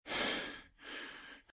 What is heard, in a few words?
RadwinIsland